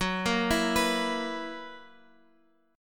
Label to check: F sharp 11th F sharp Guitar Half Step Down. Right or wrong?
Right